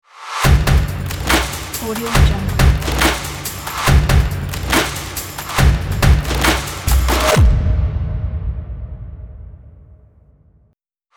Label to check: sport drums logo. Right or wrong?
Right